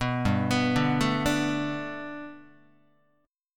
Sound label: G Major Add 9th Guitar, chord; Gadd9 arpeggiated; JGuitar